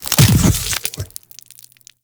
Brain Cyst Explosion; Warframe; Infested Eris

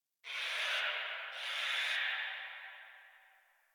Tree Distant Critters Happy Calm, Ambience

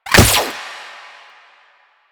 Gunbrella Bullet Deflect, Weapons

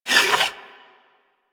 Leg Movement; Enemies; Warframe

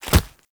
Grunt Foley Kick Impact; Weapons; Enemy

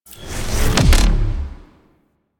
Recall Charge Short; Railjack

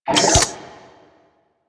Sidearm Charge; Weapons; Warframe; Tenno; Wraith

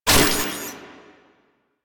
Shotgun Empty Reload; Weapons; Warframe; Pax Duviricus

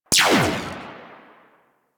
Spear Gun Tail, Weapons; Warframe; Corpus

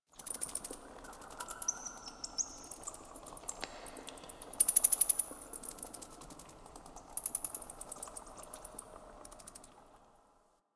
Cave Bats; Warframe; Duviri

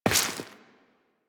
Step Flesh, Footsteps, Walk; Warframe; Tenno

